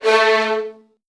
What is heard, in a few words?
A1, VN 16TH, Virtuoso Strings; ILIO; Kirk Hunter